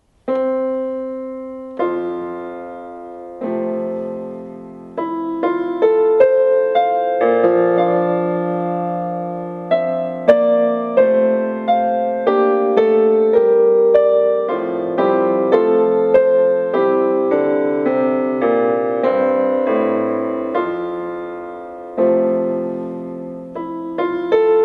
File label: EPiano